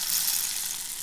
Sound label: African Rain Stick Pou